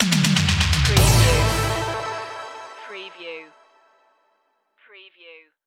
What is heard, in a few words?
8 bit skyway stinger